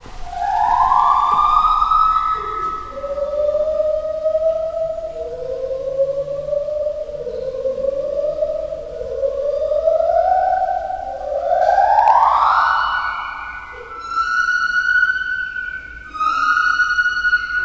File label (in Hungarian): felfelé ívelő ének, fehérkezű gibbon; debreceni zoo 2012; marantz puska